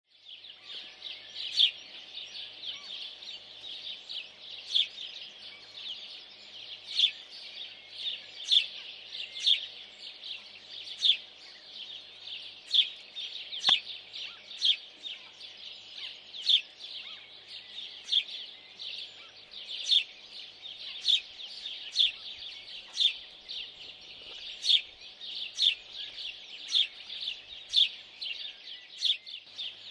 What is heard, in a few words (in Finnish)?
varpunen; Verkkainen silputus ”tsilp tsilp” tai ”tsrip tsrip”; Yleinen lähes koko maassa sekä kaupungeissa että maaseudulla